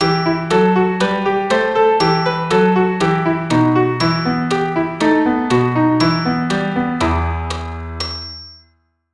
Em harmonic, keyboard

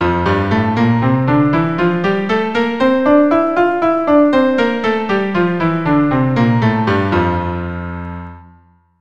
F Major 100

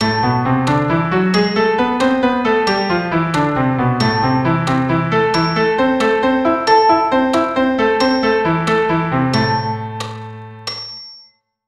Am harmonic

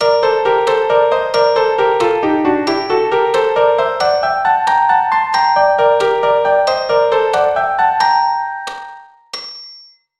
Gm melodic

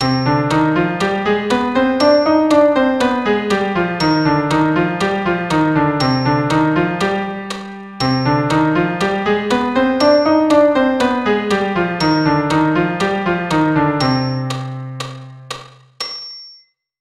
Cm harmonic, keyboard

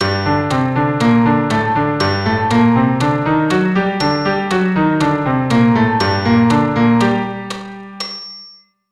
Gm harmonic

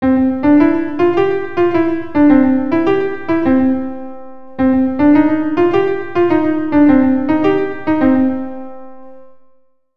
short swing

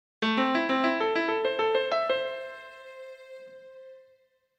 Am arp, keyboard

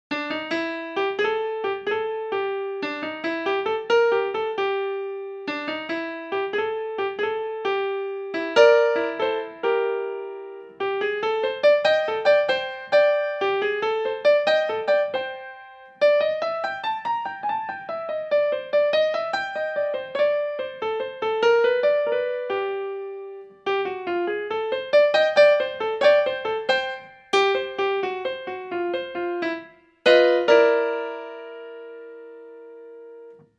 C7 12 bar

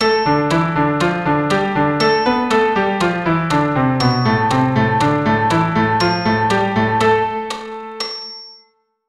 Am natural

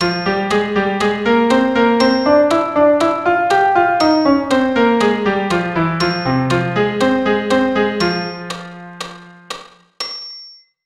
Fm melodic, keyboard

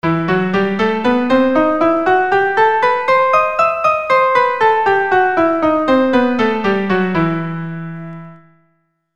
Em Harmonic 100, keyboard

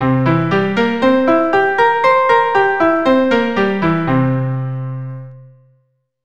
C7 Arp 100, keyboard; C7 Arpeggio

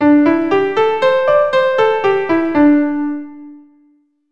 C Major Pent Mode

